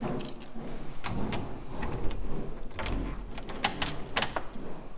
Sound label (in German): Tuer Abschliessen